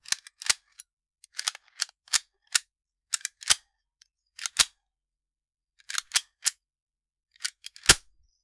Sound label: realistic sound of reload, assault rifle